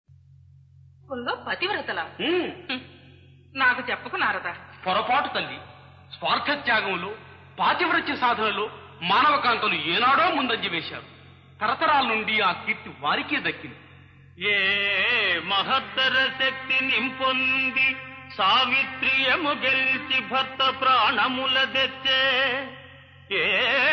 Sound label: Padyam; SatiTulasi